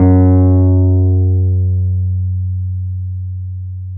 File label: PNO T.Wurly F# 3; Keyboards of The 60's and 70's